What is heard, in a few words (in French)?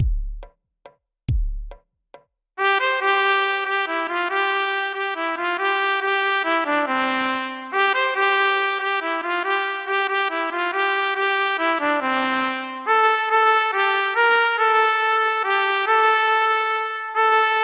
Trompette Solo 140bpm